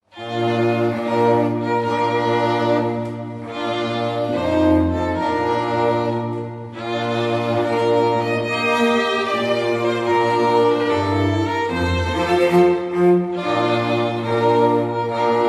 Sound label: Bridal Chorus